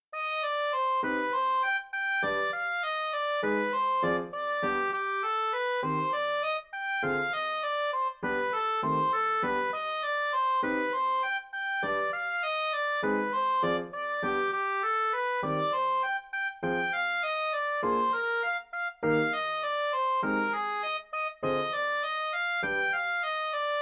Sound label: soprano sax; Cmusic Production